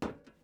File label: metal step; teardown; ps5 windows xbox series xs